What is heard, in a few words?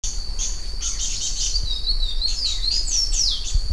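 Basileuterus culicivorus song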